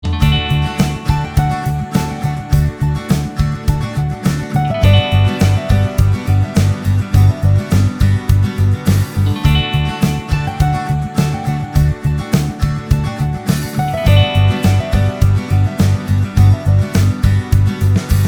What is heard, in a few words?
(HOLIDAY MIX)